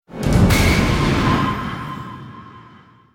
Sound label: Stinger Action Short